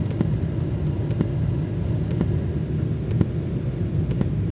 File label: click noise sample before